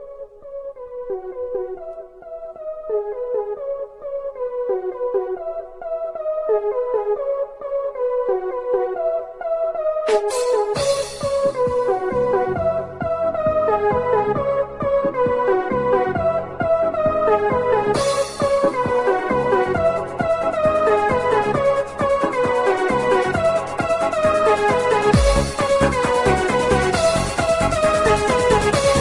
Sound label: music ringtone